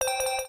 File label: combo